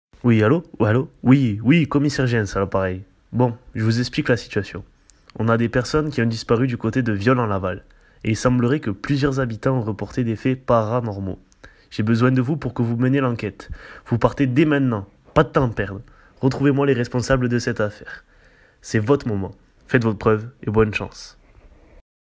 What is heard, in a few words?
Vocal